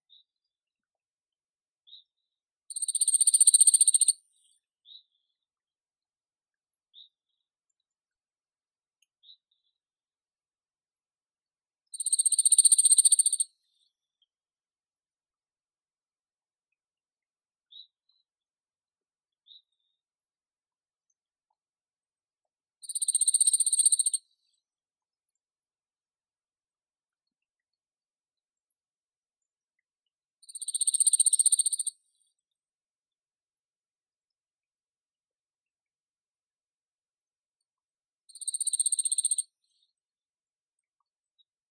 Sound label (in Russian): ovsyanka ogorodnaya emberiza cirlus